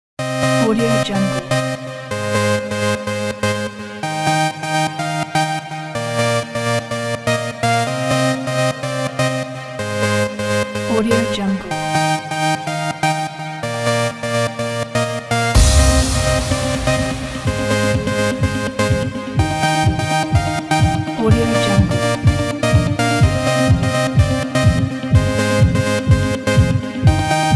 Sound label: pop dance world mood groove